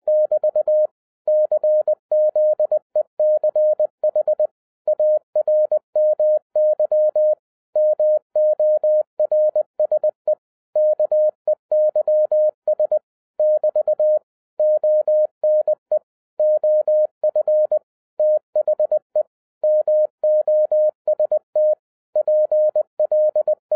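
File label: Morse code, 20 wpm; Czech Army Morse Keys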